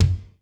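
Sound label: ROOM KICK, ROOM KITS; Crypton - DrumBase5000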